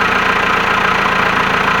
idle kubelwagon, ambient

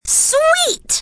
win; freak fortress 2; tails

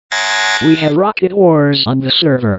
rocket; hl2dm

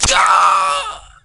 arrrhhh

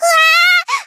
uwah; bluearchive; koyuki

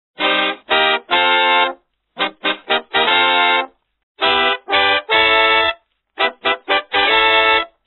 hns win

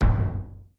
vote no

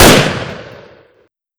fire, weapons ar2

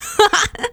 scout laugh short; femscout

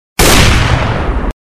awp shoot; csgo; fortnite emotes

